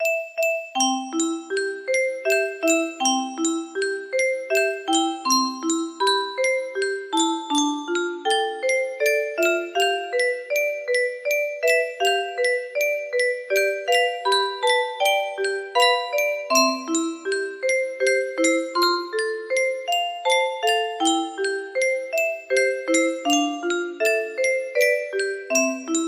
lullaby, 15 note, music box; brahms

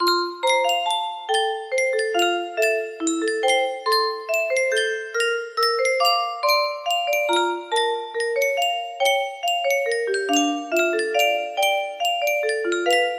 music box theme; super mario odyssey

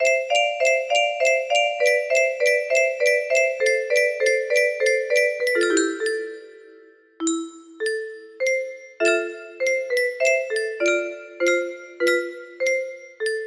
music box

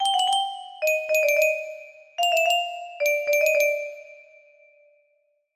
music box

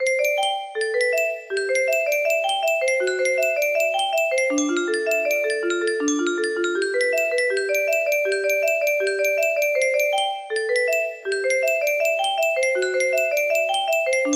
music box song

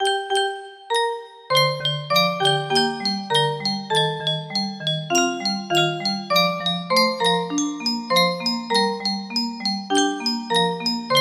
marionette music box

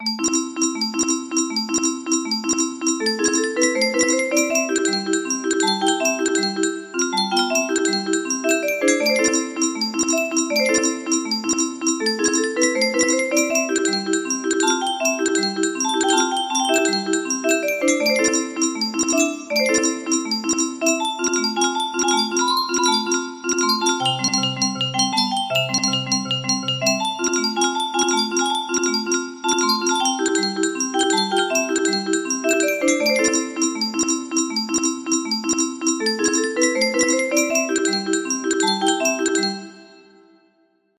acoustic grand piano

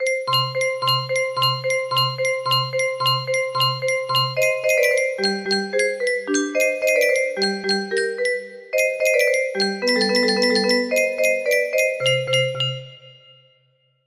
clockwork tune

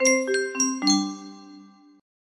music box

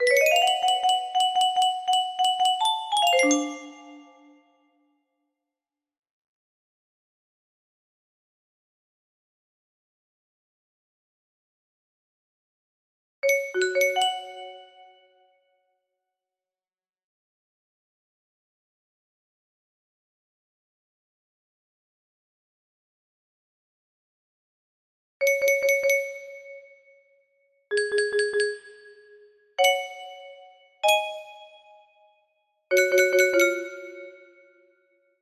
trumpet in C

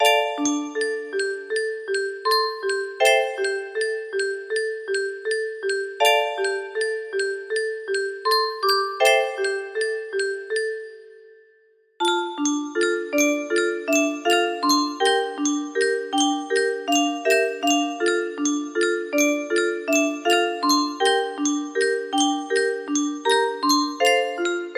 30 note scale, music box; black butler

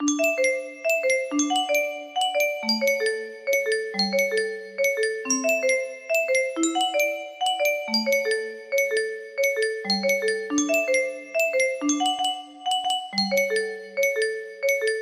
instrumental

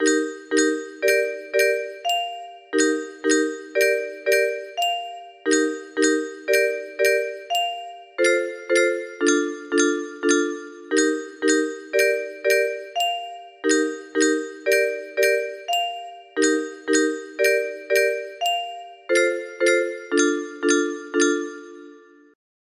substitute melody, music box

